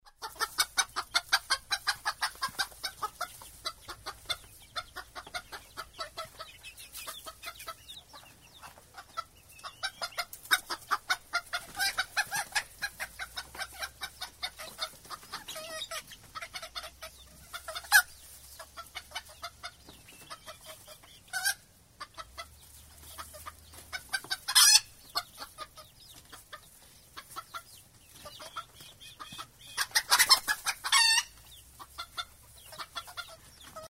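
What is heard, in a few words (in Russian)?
kurica kvochka s cyplyatami, brood hen with chicks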